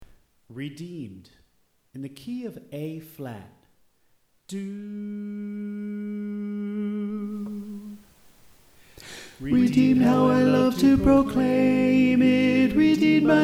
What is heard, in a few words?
Alto